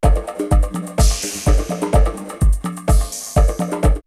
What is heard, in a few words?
R8 Elektrik, drumloops, DrumMachines; X-Static Goldmine